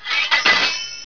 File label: sword clank